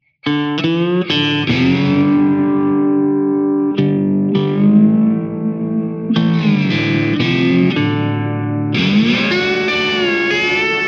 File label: Guitar Slide; Vermona DSR-3